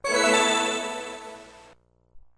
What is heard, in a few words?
Game Start Up; Pikmin 2 Puzzle Card e; GBA